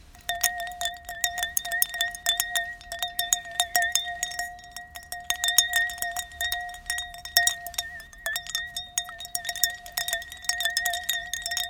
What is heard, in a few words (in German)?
Weinglas mit Eis